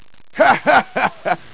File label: HEHHEH